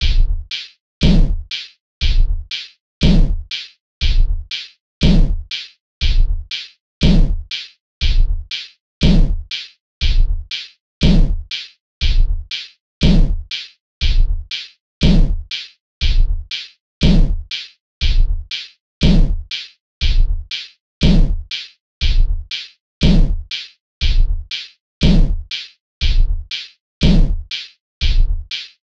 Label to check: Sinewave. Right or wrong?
Right